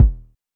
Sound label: Polite kicks D#1, Short; LIMAT Div Dept; Drums